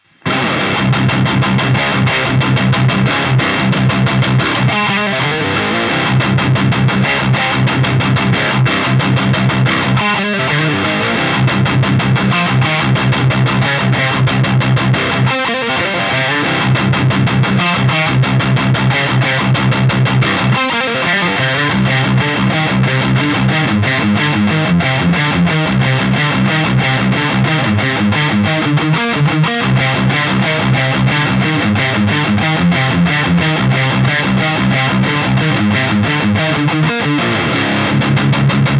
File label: Fane 2x12 SM57 metal; Cab test